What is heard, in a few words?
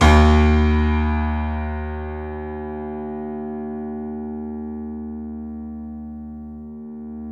C7 FF, The Ultimate Piano Collection; East West